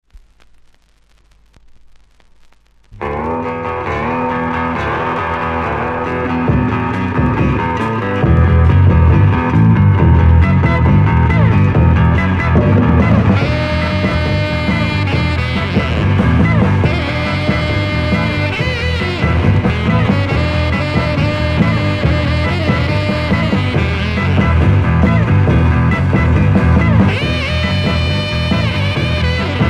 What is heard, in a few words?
Big Beat amiga